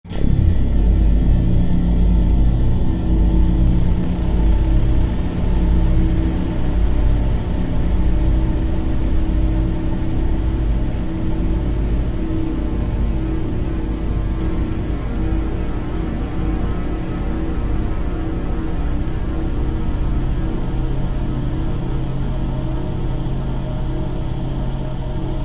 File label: horror atmosphere dark fear long slow decay; zapsplat